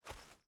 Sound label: run; A Cat Game; Monarkie Digital